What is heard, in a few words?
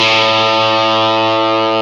GTR Tube, Dirty; Club-50 - Foundations Roland